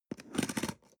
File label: cutter cardboard open soft; bueroarbeit